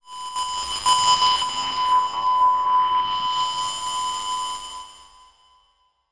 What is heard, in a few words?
sound design feedback tone delayed panned